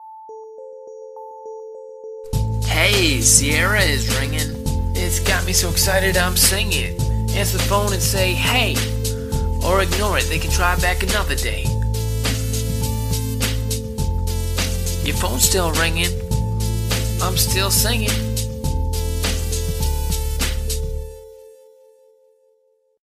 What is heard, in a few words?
Is Calling Rap, ringtones